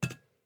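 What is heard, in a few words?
Crucifix drop; Phasmophobia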